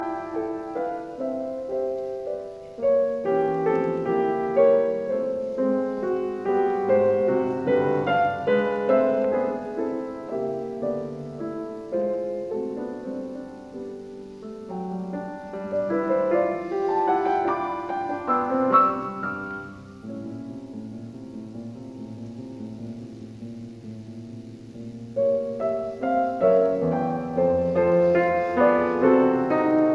Variations in C major on a Waltz; 33 Variations; Op 120; L'istess

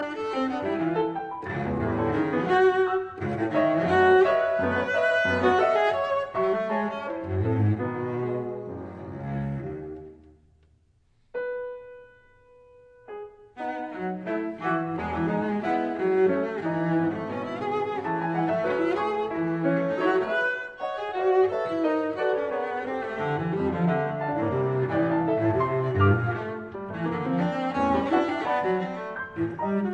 Cello Sonata, Anime Gai; Milhaud; Op 377

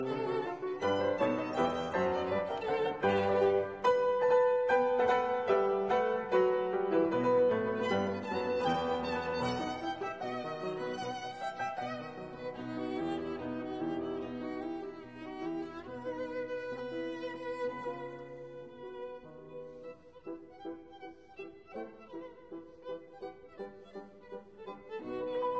Violin Sonatina in G major, Finale Allegro

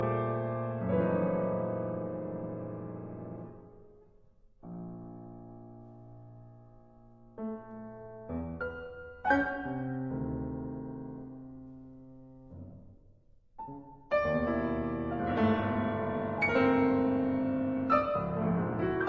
Piano Sonata; Tropes

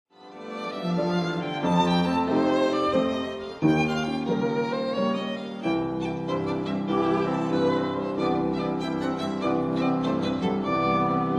Trio, Op. 112 Recitave and Aria; Creston

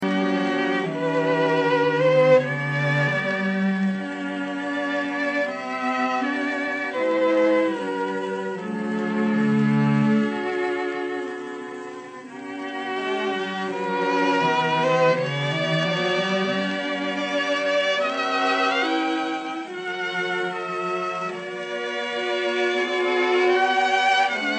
arr. for string quartet; Old Black Joe; Poor Old Joe

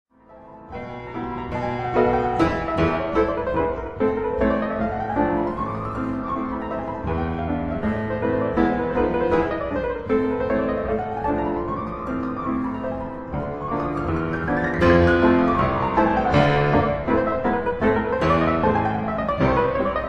Etudes for piano in A minor

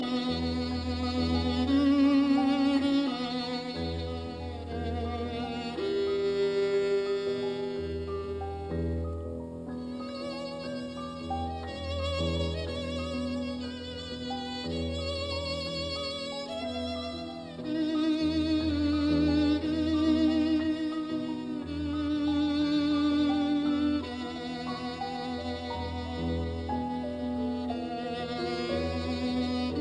Violin Sonata, Molto quieto; Bloch; Louis Kaufman

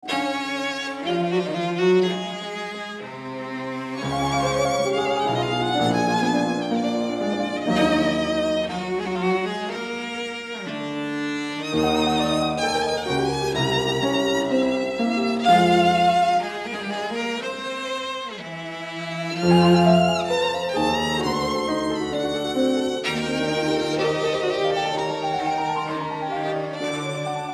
Piano Quartet in E-flat Allegro; Mozart

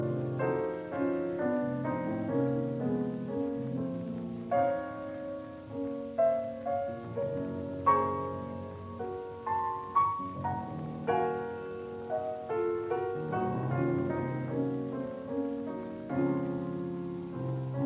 Piano Pieces Intermezzo in E flat minor; 6 Piano Pieces Op 118 No 6; Wilhelm Backhaus

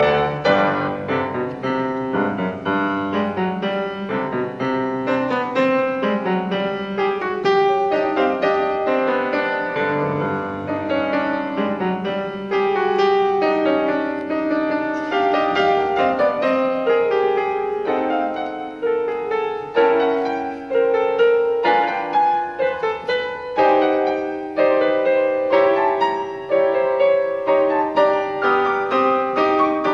Variations in C major on a Waltz; 33 Variations; Op 120; Variation 9; Allegro

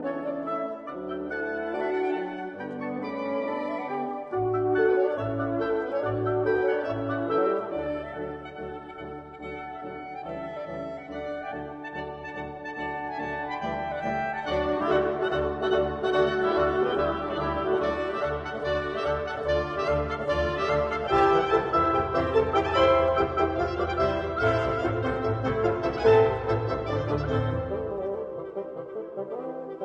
Slavonic Dance in C major arr for wind ensemble; Slavonic Dance No 15; Op 72

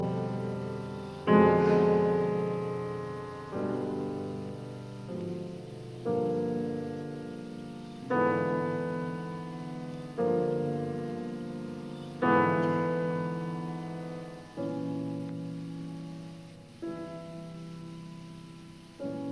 Variations in C major on a Waltz; 33 Variations; Op 120; Andante

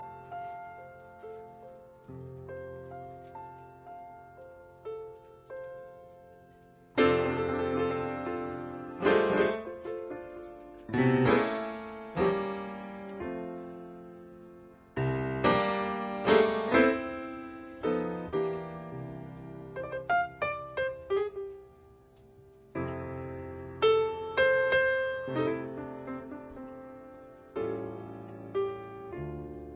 Symphony arr for piano; Goin' Home

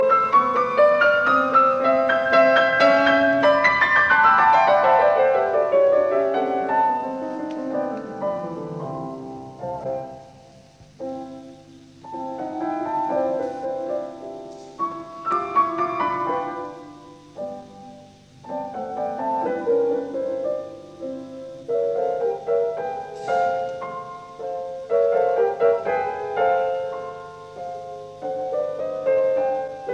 Variations in C major on a Waltz; 33 Variations; Op 120

